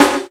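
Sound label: electronic snare drum; Best Service Hyper Dance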